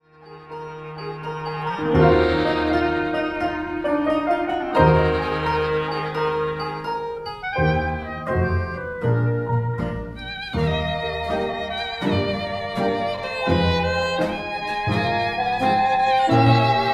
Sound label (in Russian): instrumentalnyiy ansambl, medlennyiy fokstrot